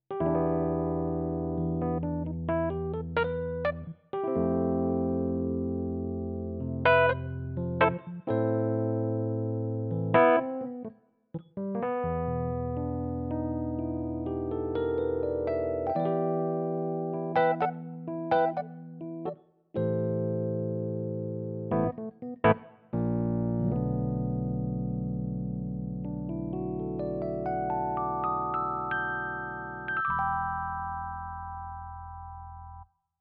E Piano

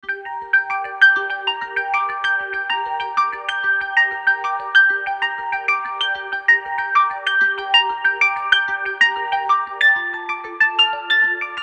Clavinet Pianet Duo Muted Vibra

